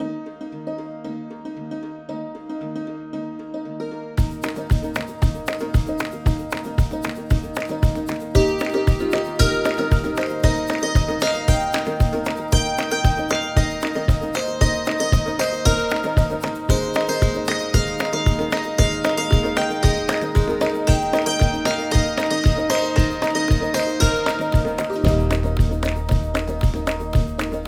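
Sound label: hammered dulcimer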